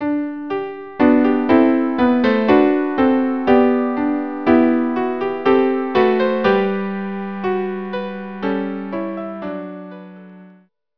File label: trio